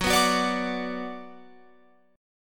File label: F sharp Suspended 4th Sharp 5th, Guitar, Open F; strummed; 18,18,18,21,21,21